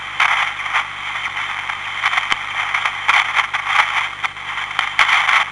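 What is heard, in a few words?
rustle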